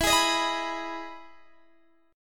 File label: E Major 7th E down Guitar DADGAD